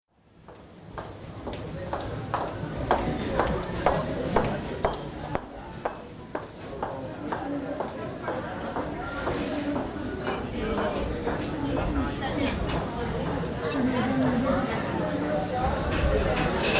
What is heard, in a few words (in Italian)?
San Stefano; suoni